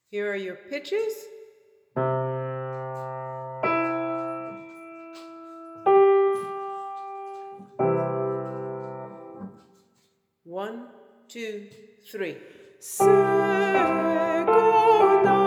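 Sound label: Soprano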